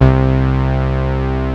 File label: SPLIT; Rubber Chicken Software Sample Bank